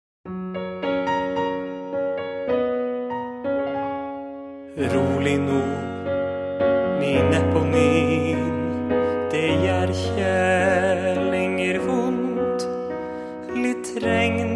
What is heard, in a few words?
piano